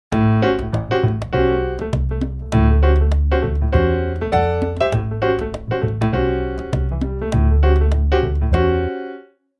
Jazz Piano, ringtones; Tecno Phantom V Fold

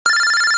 Ringer, BlackBerry; OS-4.6

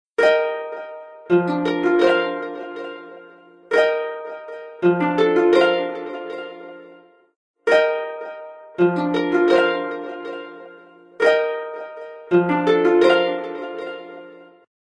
Sea Breeze, alarms

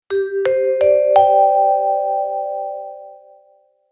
Power off, phone; LG; LG265T-Banter

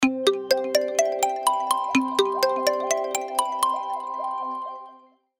Xylophone; Coolpad; Fancy-3

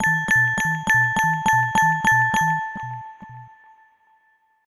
Chirping Beat; ringtones; Samsung Galaxy S25 Ultra

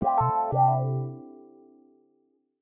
Wah-Wah, notifications; Samsung Galaxy S25 Ultra; Fun

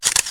Shutter; LG

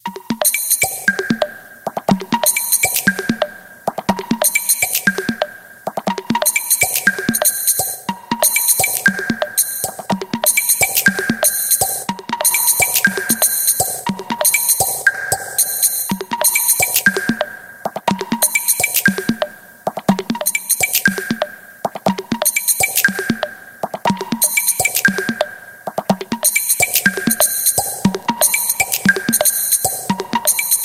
Underground pool, ringtones; Samsung; Galaxy R Style SHV-E170s

